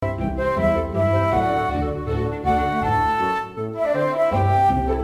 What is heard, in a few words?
Game Tune